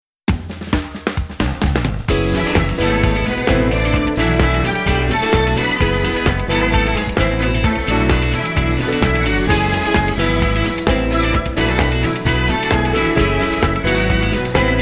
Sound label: Strings Unis